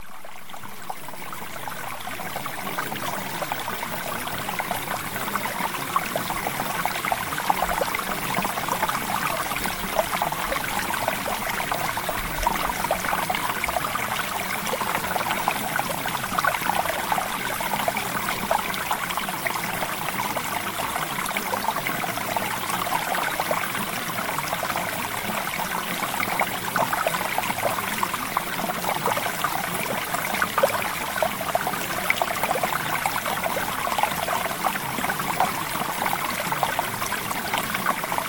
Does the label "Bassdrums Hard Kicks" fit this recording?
No